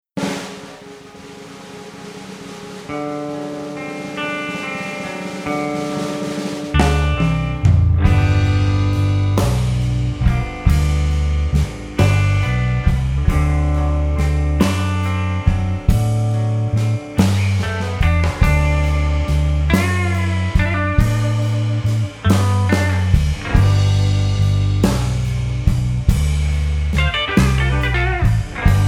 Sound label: slow blues